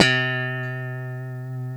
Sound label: C#3 FUNK POP, GUITARS, FUNK STRAT; Spectrasonics; Hans Zimmer Guitars VOL-1